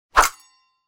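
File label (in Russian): взмах со звоном металла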